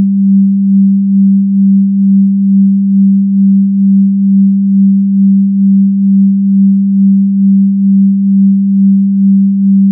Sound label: g tone